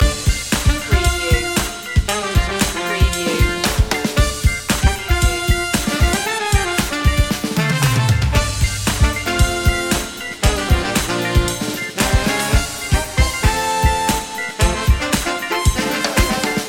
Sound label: the funky two loop